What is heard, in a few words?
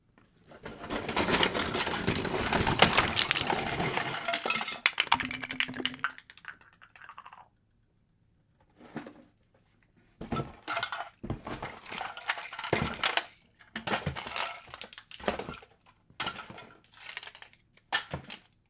Crash Toys